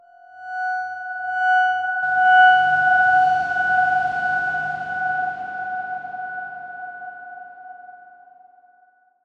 Darkswarm F#1 f; HSS Ableton Pack1

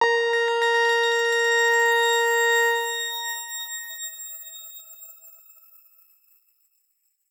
X Grain A#4 ff; healing soundscapes; HSS Ableton Pack1

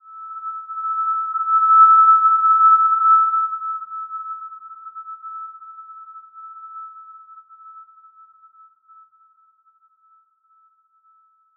Simple Glow E6 mf; HSS-Custom-1; healing soundscapes

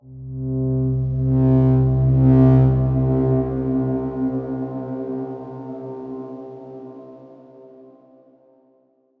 Darkswarm C5 f; HSS Ableton Pack1

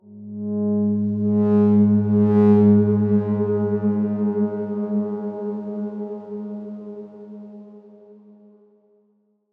Darkswarm G#2 ff; HSS Ableton Pack1

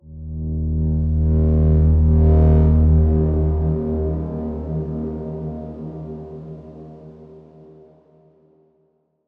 Darkswarm D#1 ff; HSS Ableton Pack1

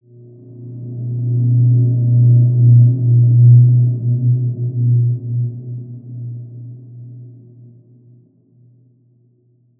Large Space B2 p, healing soundscapes

